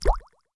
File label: water